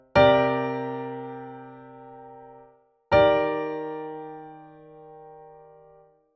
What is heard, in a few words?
Ninths in verse